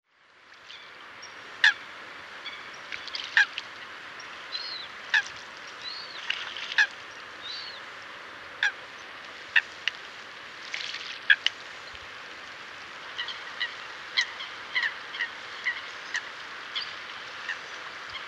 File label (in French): foulque macroule